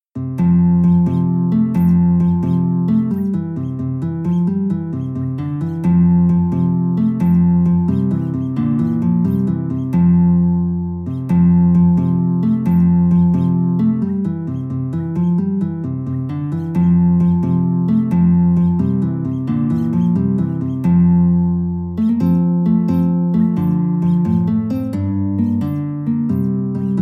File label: guitar; new rigged ship